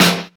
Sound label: sizzly snare drum sound a sharp key; snare drum samples